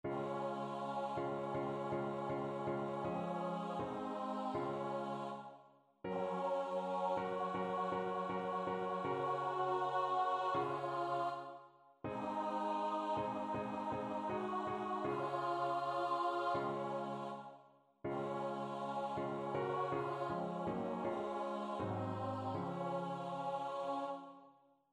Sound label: Alto; God Be with You